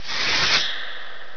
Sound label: scrape; Master Clips Email Animator